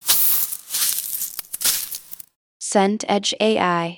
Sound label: leaves walk